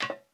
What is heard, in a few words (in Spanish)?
Zapato tacon escalera metal; Yesterday Origins